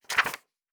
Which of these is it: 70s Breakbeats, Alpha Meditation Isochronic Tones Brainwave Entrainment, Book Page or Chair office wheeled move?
Book Page